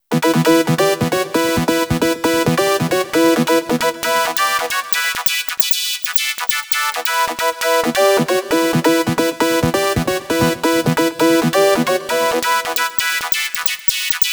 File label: Prophetic Leads, Electro Lounge; Sonic Foundry (Sony Creative Software); 134 Six On Nine